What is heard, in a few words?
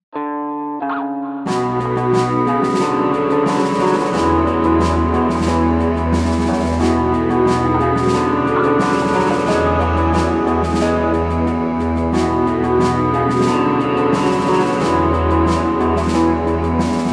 Promotion Fanfare